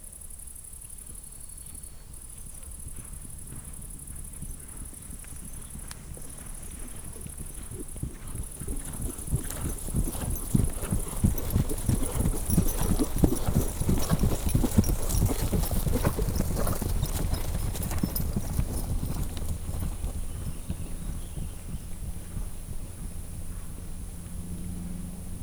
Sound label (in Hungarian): poroszkalo csapat, lo; állathangok; paraszt udvar